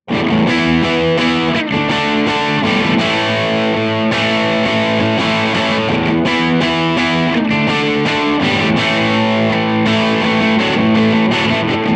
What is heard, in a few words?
crunch; jensentone